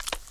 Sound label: Pickup; Pinguinos Biri Game; Android